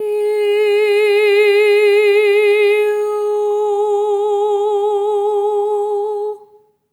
EH OH G#4, SOP; Spectrasonics; Symphony of Voices

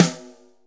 Snare, Akai MPC500

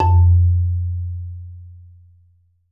Marimba F1; Sampleheads - Dave Samuels Marimba & Vibes

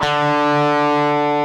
DIST D3, DIST GUIT

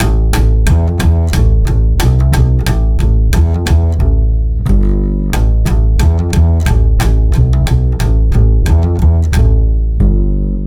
Acoustic Bass

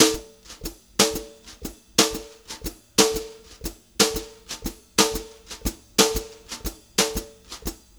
120 JZ BEAT, Brushed Drum Loops; USB Soundscan vol.39; AKAI; JAZZY

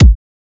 kick fuming

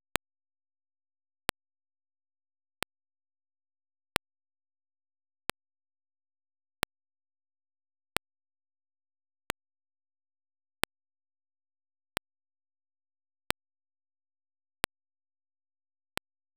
Noise, LoFi Noise; DIS Noise TY2 - EP; Roland BK-5

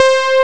piano synth; Amiga Soundtracker Sample Packs

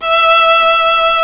Chamber; Roland L-CD702; Combos 4; Chamber Grp2

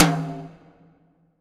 R TOM HI, Drum Mashines; EdgeSounds; Drum Mashines VOL-1; R-100 DRUMS

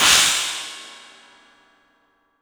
CYMBALS; 3D Sound; 3D Drums

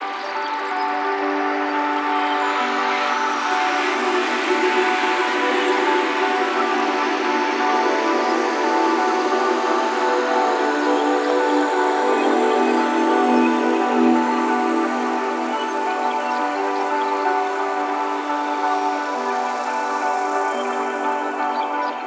big evolving pad E

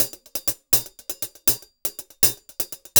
080, Top Ends, Beats; Zero-G; Monster Beats

